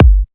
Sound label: KICK